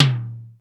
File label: DRY T, drum; Crypton; DrumBase5000